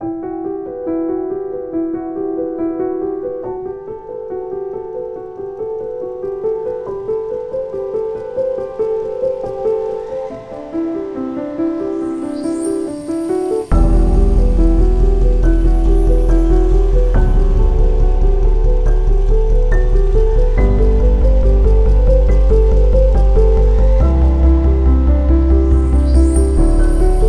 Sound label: soundscape piano emotional